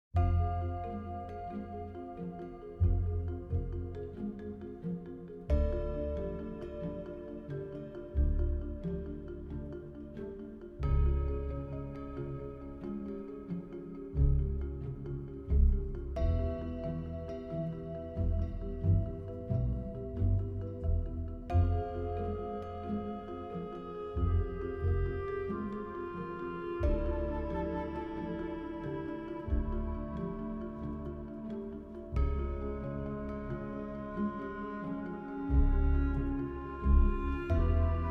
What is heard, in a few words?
Rotating Room; wellspring